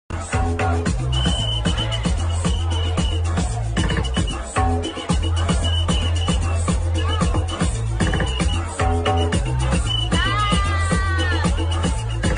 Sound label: Whistle Version